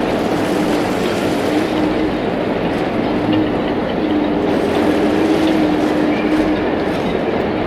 Harbor Ambience

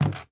Wood soft

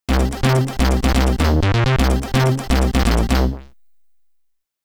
Get Ready! (SID Stereo); hermetic; commodore 64

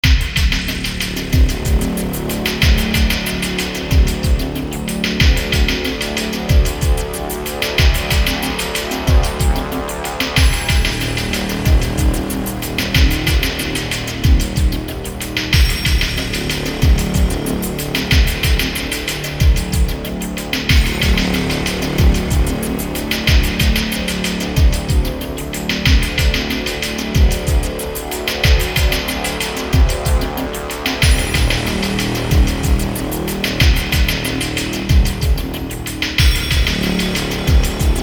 Antarctica - Ambience

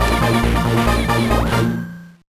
Win; Orbulon

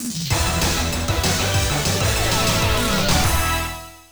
Stage Clear; violent storm arcade; Kick It!

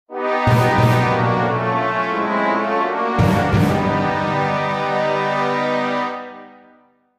Retreat Battle; heroes of might magic v gamerip 2006; Haven

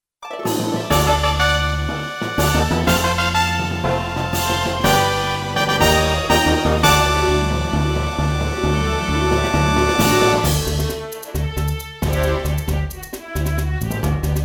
Victory Fanfare; Lunar Dragon Song; Nintendo DS

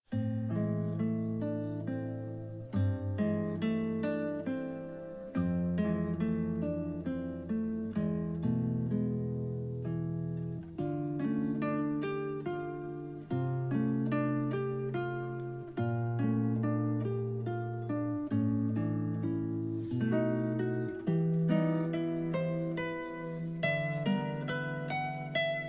Guitar

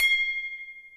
Hint; 7 Wonders of the Ancient World

